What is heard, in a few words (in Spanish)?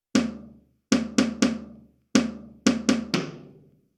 Ritmo